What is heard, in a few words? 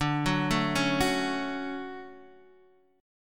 D-Major 7th D down Guitar Half Step Down